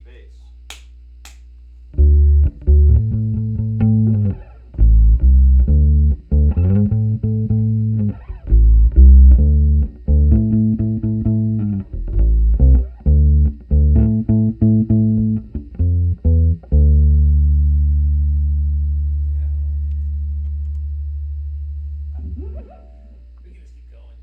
1973 bass

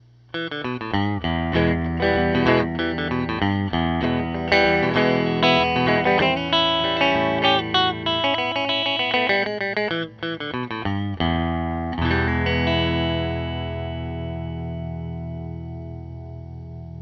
EL GUITAR FET

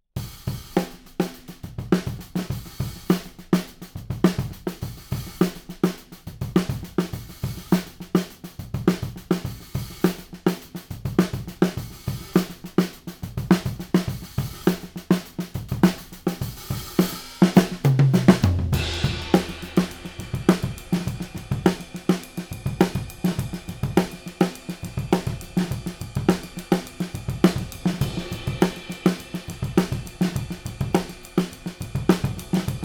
OVERHEADS; TUBE

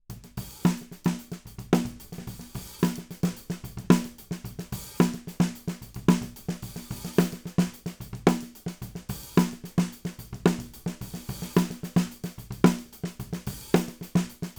SNARE FET

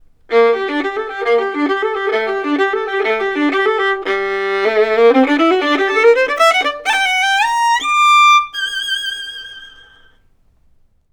VIOLIN FET STEREO

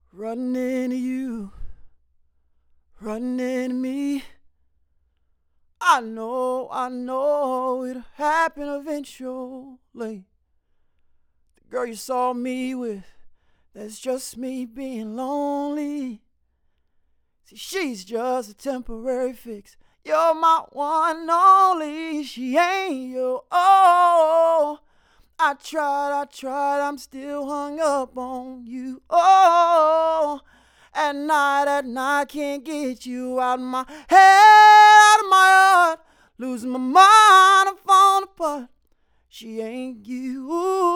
MALE VOX FET

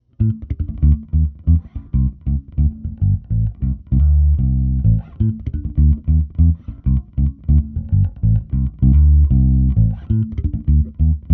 EL BASS FET

